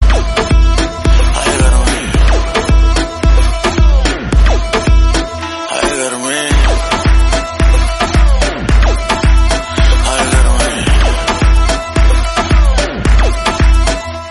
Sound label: bollywood dance musical song tone ringtone